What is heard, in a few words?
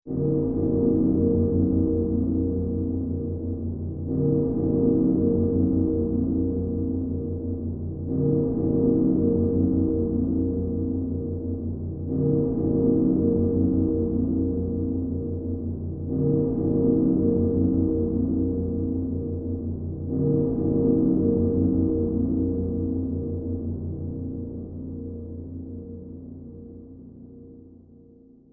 Church Bell Haunted Ominous Desolate Scary; Horror; Scary Tone; Scary Tones